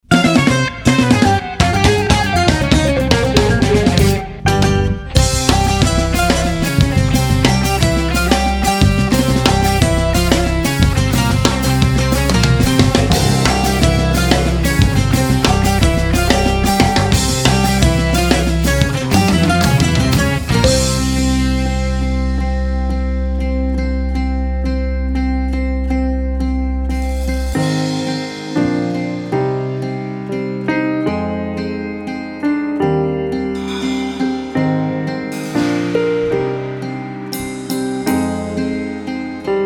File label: bouzouki; Sonic Collective